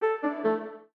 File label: Low Battery; Tecno; Spark Go 2022